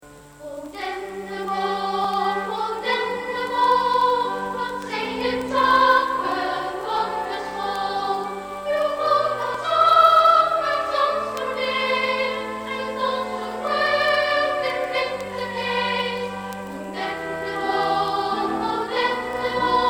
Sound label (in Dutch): kinderkoor; haags